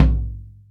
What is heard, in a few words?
mid tom one shot a sharp key; tom drum